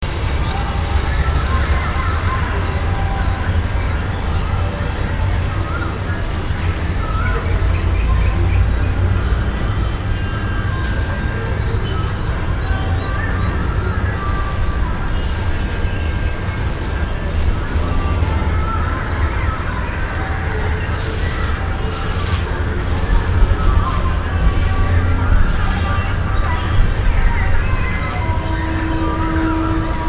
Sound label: early am sounds, india; Ted P. Eugenis